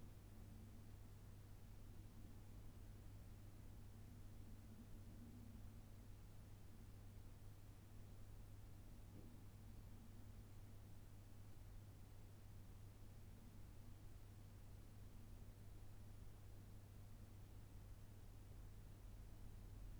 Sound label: Sennheiser Ambeo 4 Channels Self noise only; 4 Channels Matched Loudness 23LUFS; spatial microphone test; Atmosphaeres